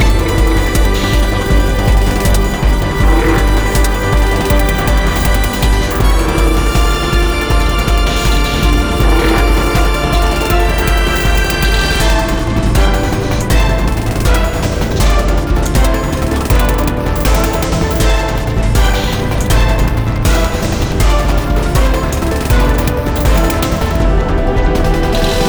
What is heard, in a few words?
Steelworks Fast; rive wreck hack die retry; ps4